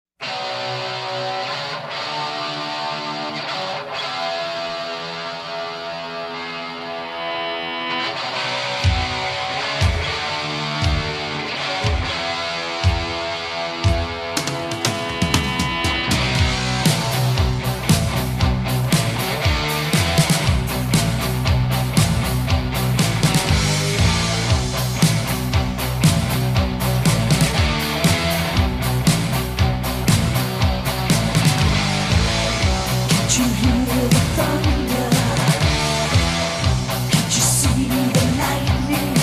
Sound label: Heavy Metal Thunder